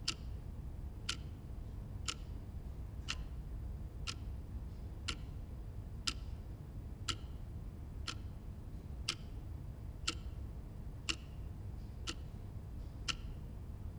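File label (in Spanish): Ambiente libreria, library ambience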